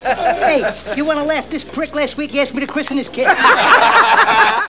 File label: laugh; mobflicks